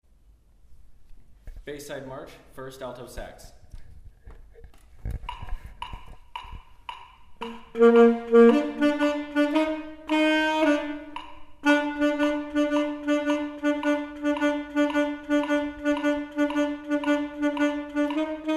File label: Alto; Bayside